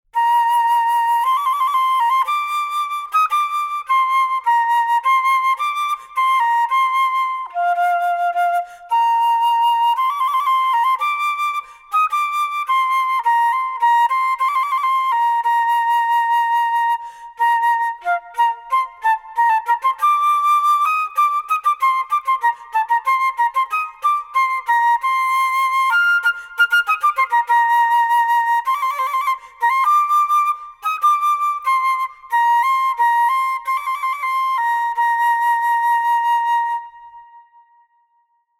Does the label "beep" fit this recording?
No